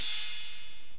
mc303 ride; Drum Hits; CYMBALS

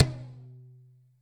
TOM C1 LOU L; Zero-G; Chemical Beats

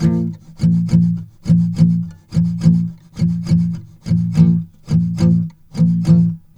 B 2 L, Guitars, SLOW SHUF; Spectrasonics; Hans Zimmer Guitars VOL-1

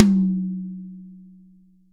PR8TMDRMHL, drums toms dry; Real Mega Drums

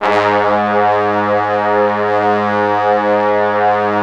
G#1, Orchestral Brass; Best Service ProSamples vol.20 - Orchestral Brass; AKAI

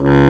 SAX XBASS, Saxophones; All Saxes

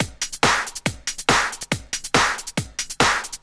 Addon Loop, Drum Loops 140bpm, Perc Loops

